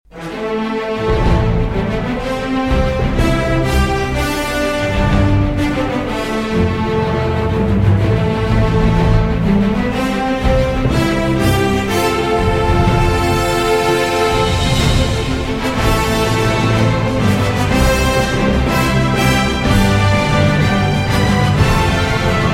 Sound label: Ride to Victory, Instrumental